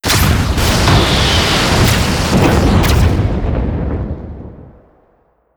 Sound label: space battle; Stellaris